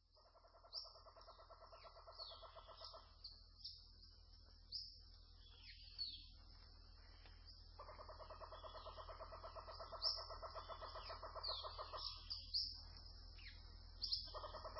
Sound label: Rainforest Sounds; relax